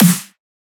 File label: Dubstep Snare; Ghosthack